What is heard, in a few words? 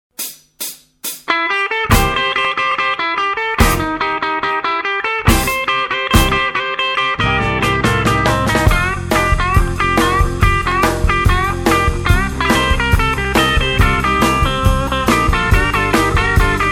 blues jazz